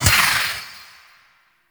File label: Fairy Soul Pickup